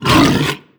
grunt unhappy, barnacle creatures; Half Life Alyx

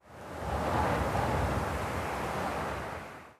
wind gust

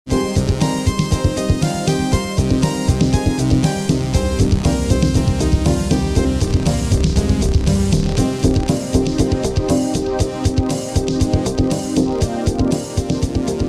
start action, music

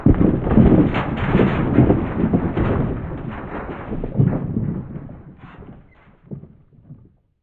church, ambient; Counter Strike Model Pack; CS:GO; inferno

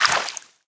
water entry

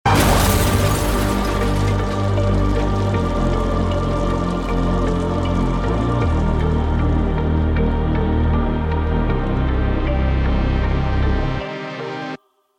start action, music

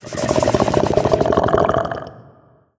idle croak, barnacle; Half Life Alyx

